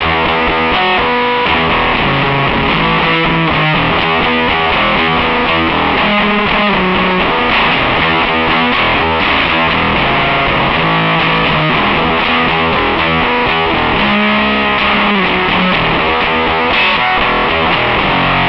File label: fuzz and octave